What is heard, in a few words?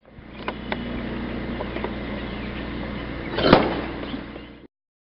grunt slap